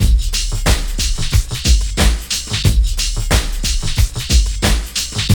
TRIP 90, trip loop

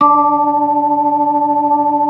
B3 D 3; Best Service; Advanced Media Trax Modern Composer; B3 LES 1

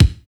FIRM KICK; Drums In Your FACE!

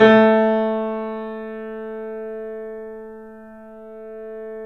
A -L, The Piano; InVision Interactive - The Piano

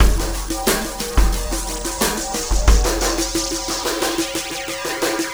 Ripple Perc; Ripples; Sonic Foundry (Sony Creative Software); Processed Drumkits Zero Gravity Beats

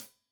Hat Tight, Hi-Hat, Cymbals; Hat Tight 1; Stereo; Deeply Sampled Drums

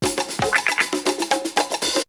Drumloop; ZERO G - Datafile DISC 3

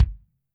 KICK, Drums Percussion

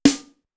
Snare, Pitched Up; Clavia DDrum-AT

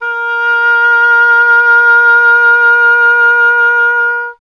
WND OBOE, Solo Instruments; Miroslav Vitous - Solo Instruments VOL-2

